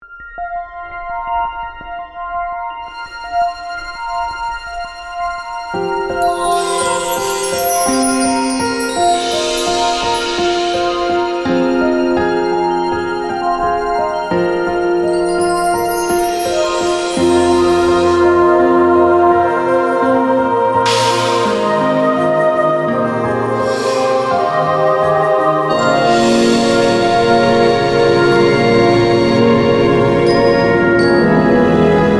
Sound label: SCI FI FANTASY